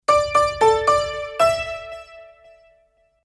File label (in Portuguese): SINAL SONORO